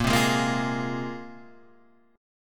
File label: A sharp 7th Suspended 2nd, guitar chord; x,13,10,13,10,12,10,x